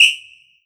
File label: Korg TR Rack Standard Kit Short Whistle